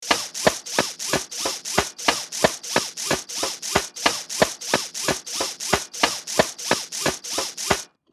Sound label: Animal RC toy movement mechanical; rc toys